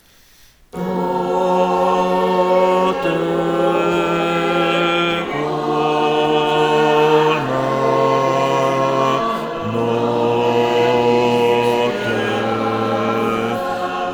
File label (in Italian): prove BAS; Notte di luce